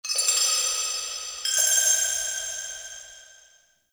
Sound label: Vendor Crystal Coin; Ambience; Soul Plane